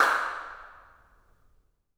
incinerator dirac

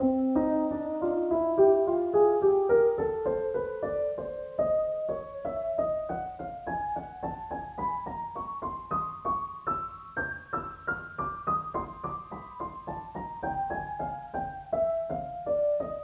piano track

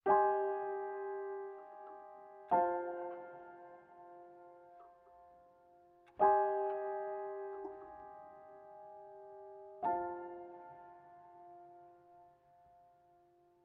tune

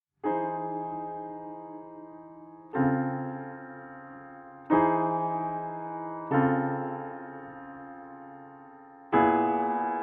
tune

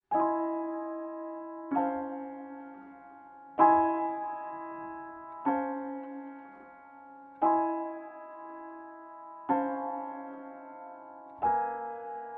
tune

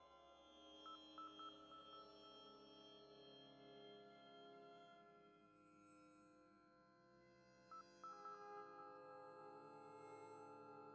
puzzle action loop layer fx; freeflight

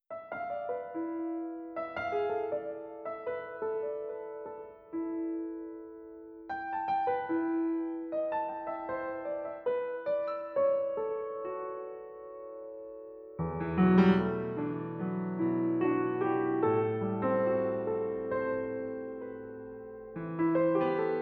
piano

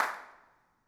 incinerator dirac

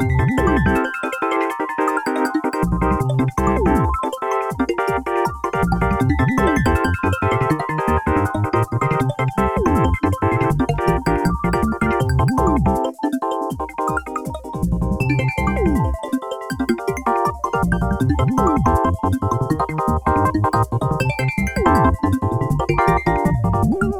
Hit bonus invincible; Go go woony

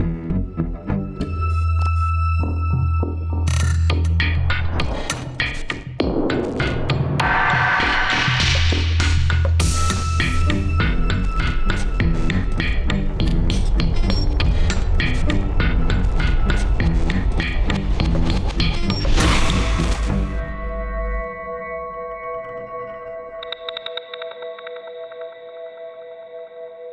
respawn

